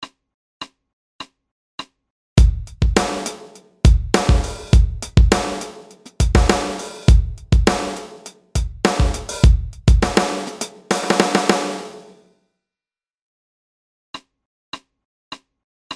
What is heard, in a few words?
Bass And Drum Loops